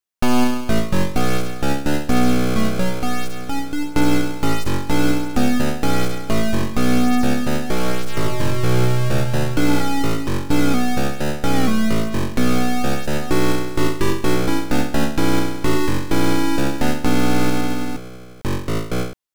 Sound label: Intro, Tandy 1000; F-19 Stealth Fighter